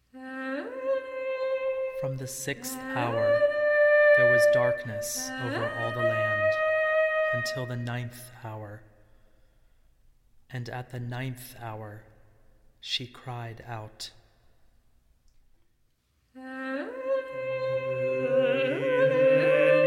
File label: Version For 4 Voices & Percussion; No. 11, From the Sixth Hour